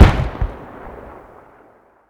grenade explosion far

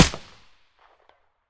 aps silencer mono; STALKER; IWP

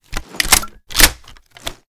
b725 misfire, weapons

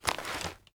sprint start; STALKER; IWP

